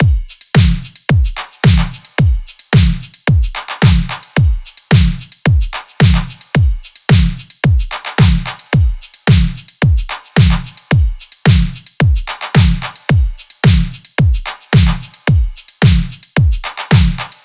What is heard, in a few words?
drums 110; Drum Loops; Full Drums; Rabbit; Slate; MIDNIGHT Sample Pack